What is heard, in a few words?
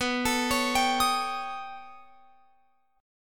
B Augmented 9th B down Guitar Open F; B+9; arpeggiated; JGuitar